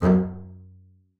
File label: F#2, BASS SPICC; Xsample VOL-5